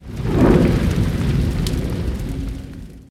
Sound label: fire